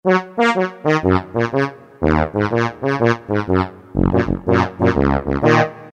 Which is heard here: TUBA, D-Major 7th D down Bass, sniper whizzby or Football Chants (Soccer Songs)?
TUBA